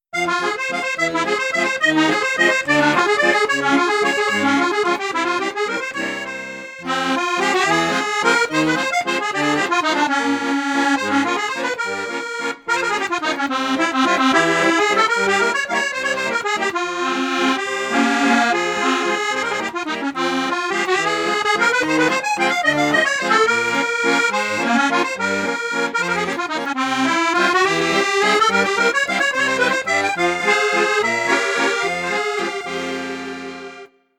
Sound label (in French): registre 3 flutes; crucianelli